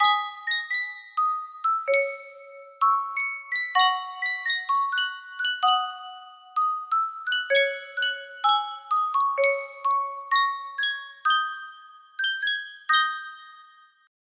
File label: Sankyo music box